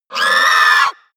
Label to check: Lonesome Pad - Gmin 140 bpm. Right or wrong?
Wrong